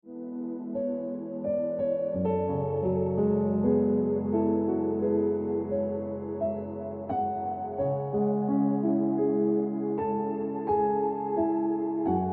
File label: Piano Music